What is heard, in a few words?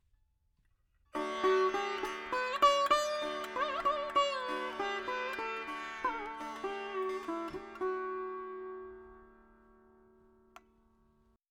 4 4 D 100 Dry, Melody Veena; Sample Banks